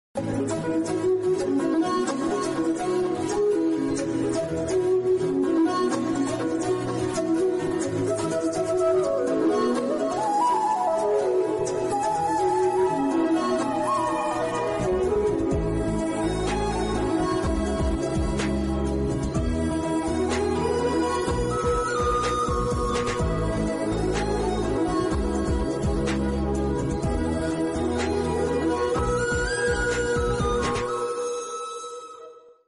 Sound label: love tone happy wedding bgm telugu ringtone heart touching ringtone